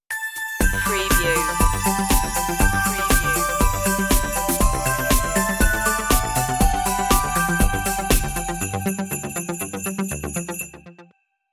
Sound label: merry christmas synthified stinger intro